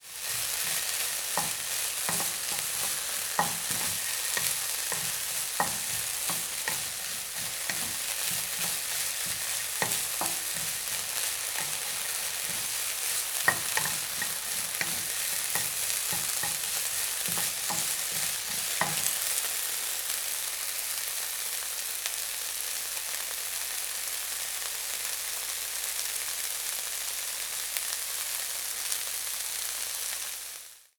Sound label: Frying Pan Cooking